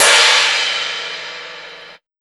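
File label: cymbal, Big Beat Drumloops, electro 125; USB Soundscan vol.25; AKAI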